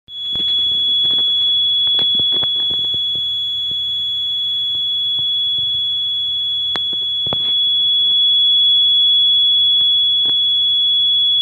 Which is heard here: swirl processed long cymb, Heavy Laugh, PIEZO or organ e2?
PIEZO